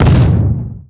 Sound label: body impact heavy, deaths